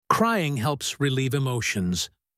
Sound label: Cry